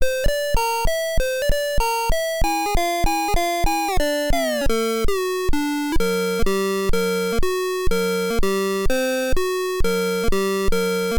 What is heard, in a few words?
melody buttons pitch; ohmegalpha